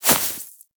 rodent hop step rear grass, forest rodent footsteps; Foley; Warframe; Characters